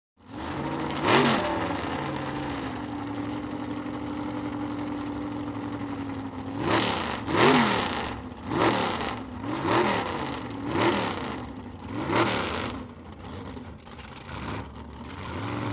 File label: Drag Boat Idle away